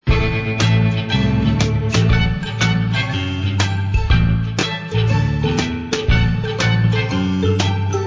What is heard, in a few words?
Open Loop; Where's My Perry